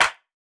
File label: G House Clap; Drums; One Shots; Claps